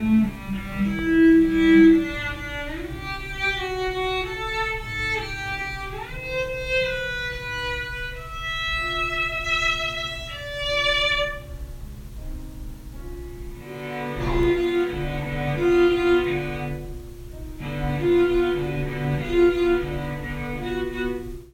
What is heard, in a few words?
Violin Practice